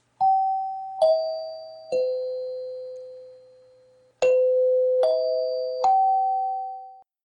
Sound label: meeting chime